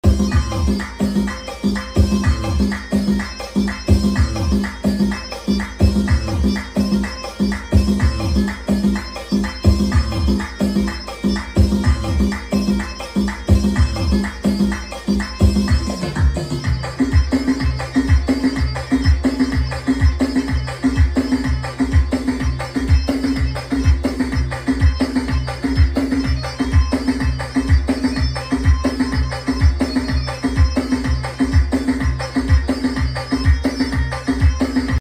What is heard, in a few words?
Lianic, ساز